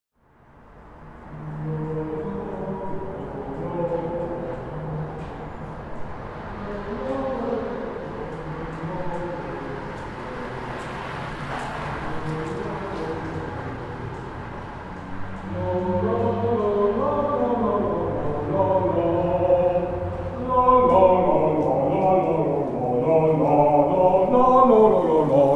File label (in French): tunnel cyclistes